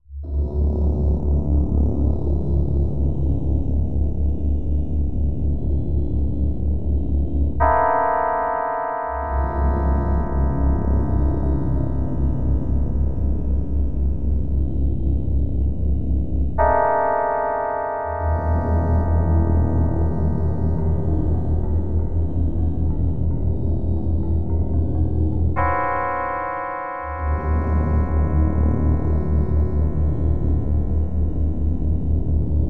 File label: cut